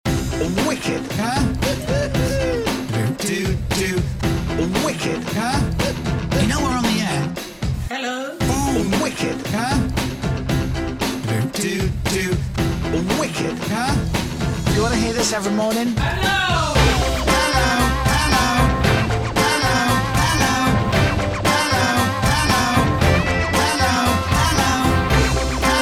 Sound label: Breakbeat mix